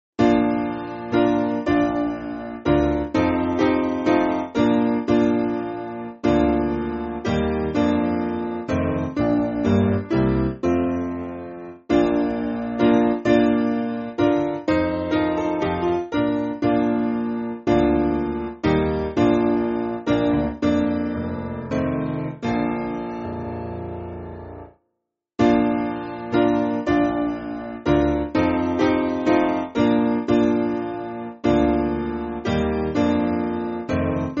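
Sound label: Piano, Backing Files for Churches; Rathburn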